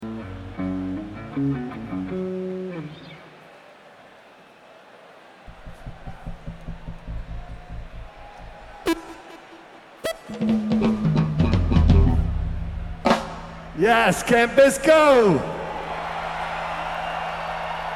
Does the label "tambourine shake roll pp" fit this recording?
No